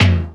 Old Skool House Perc

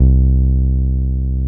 TB-303

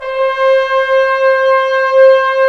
BRS BNS, Roland LA Composer; Roland - LA Composer vol. 4; Tbns

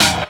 SD, Drum & Bass; Ueberschall; Drum & Bass Resonance

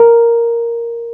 PNO, Roland Keys of the 60s and 70s, Wurly

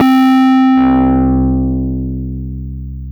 SYN, Drum & Bass, 175 BPM; Ueberschall; Drum & Bass Flash Trackz

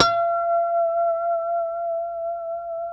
F#5 pick harmonic, Guitars; Spectrasonics; Hans Zimmer Guitars VOL-1; JC-HARMONICS

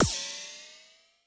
Korg 01W MegaDrums; drum machines

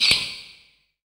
FX Dance

